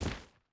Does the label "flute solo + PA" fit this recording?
No